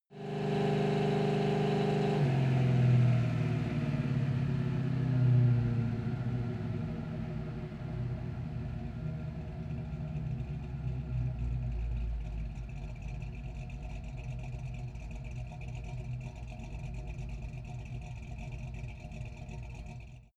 car camaro slow down exhaust

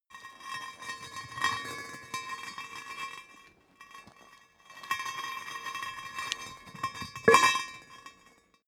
small metal rolling; industrie wirtschaft; metall

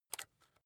rock drill head remove regular; bohrer; soundpool

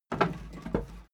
wood board handle roomy; objekte; holz

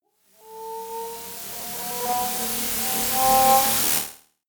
noise sizzle long, risers

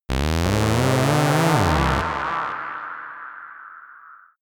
synthetic beam loading; krieg-waffen; soundpool

